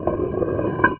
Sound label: manhole open